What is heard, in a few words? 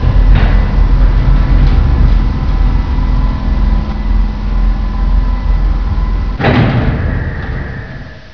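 heavy door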